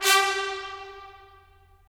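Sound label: brass trumpets staccato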